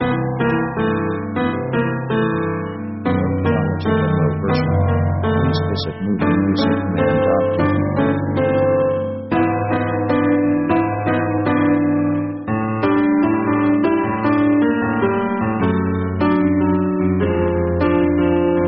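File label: orchestral brazil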